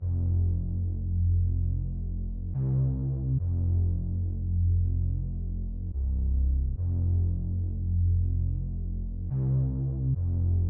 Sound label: 142 BPM F Min Bass; Melody; Loop Stems; Cymatics; Missed Payments